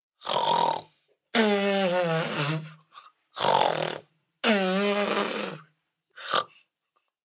Snore Comical, Snoring, 1 child, comical, several, with raspberry, human voice, kid; Breathing; VoiceBox